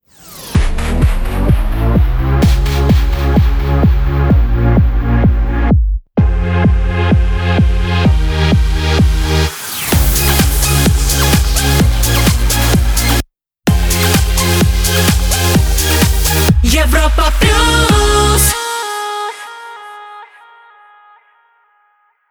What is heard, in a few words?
Jingle B Ramp; Europa Plus